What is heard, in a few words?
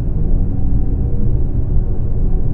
tunne loop